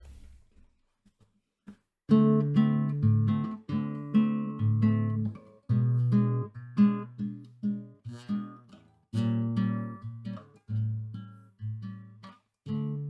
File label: School Bossa